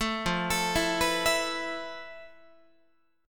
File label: F Major 7th Flat 5th Guitar; arpeggiated